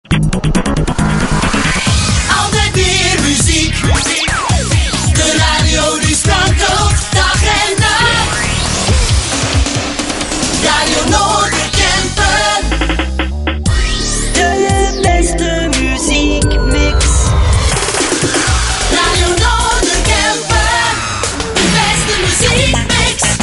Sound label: Jingles